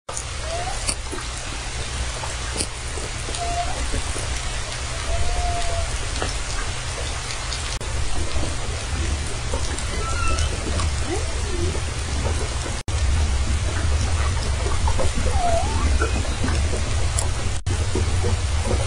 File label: humpbacks inland WA BC, biophony